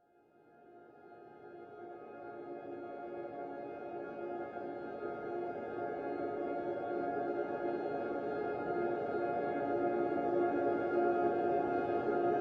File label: Gloom Light Neutral Drone; Nordic Nights Drones